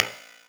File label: Snare OneShot, Processed Percussion; Sonic Foundry (Sony Creative Software); Rhythmicronics